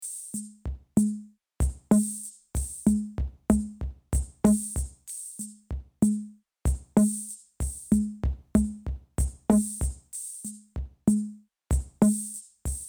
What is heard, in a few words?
Airy Loop Kit; Superior Drummer 3 Hitmaker